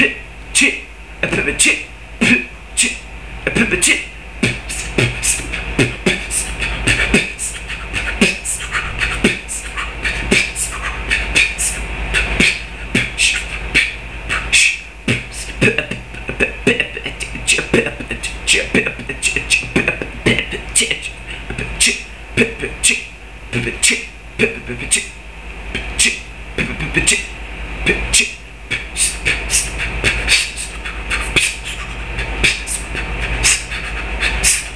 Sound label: me beatboxen